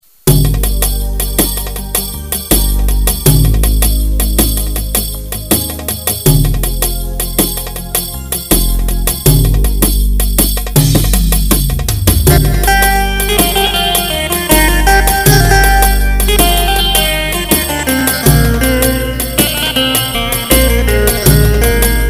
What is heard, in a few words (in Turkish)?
vahde, gw 8 yeni tr set